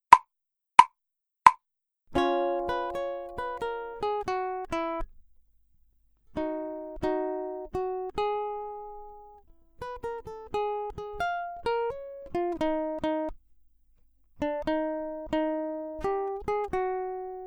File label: ukulele improvisation; grade8